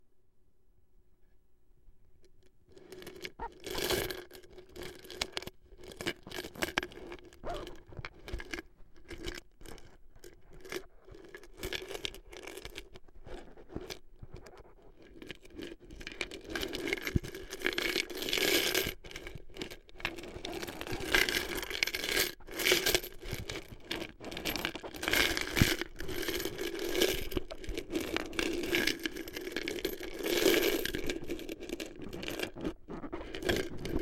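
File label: trying to get stuck money out of a piggy bank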